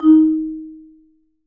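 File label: Marimba medium F D#, marimba; Sampleheads - Dave Samuels Marimba & Vibes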